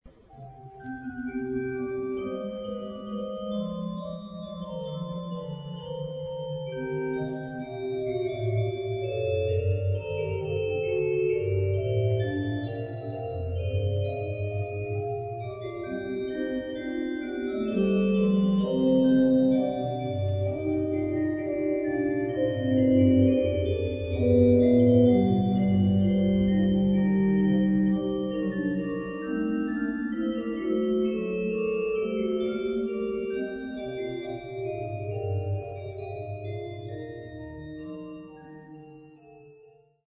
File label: choral, organ; j.s.bach; bwv647